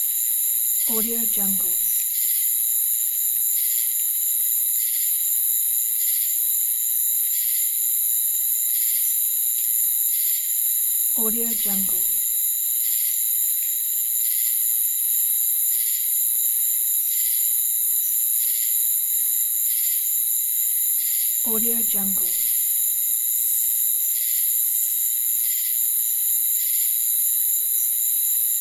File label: ambience night wildlife